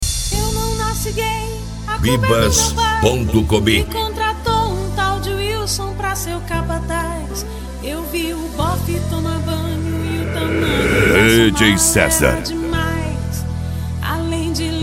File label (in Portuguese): sound car carnaval